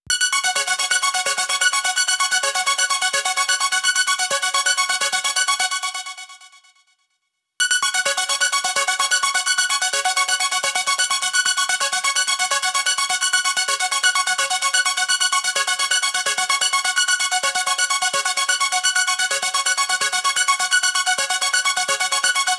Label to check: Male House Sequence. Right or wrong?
Right